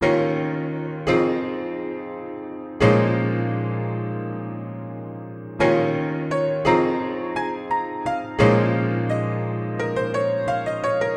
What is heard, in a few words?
Bb min 172 bpm